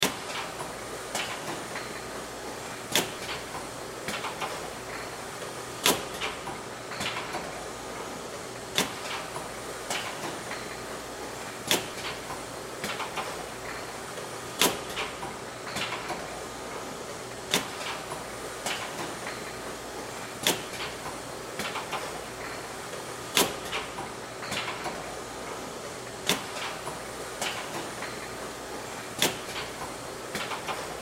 Soda Plant, Soda Plant Bottle Cleaner Machine Hydraulic Bottles Rattle Loop; Factory; Industry; Blastwave